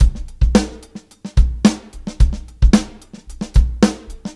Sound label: Live Beats, R&B Drums